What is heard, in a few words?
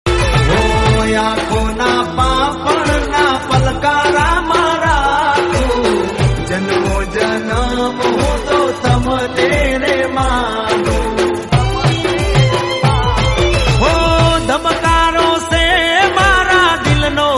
gujarati song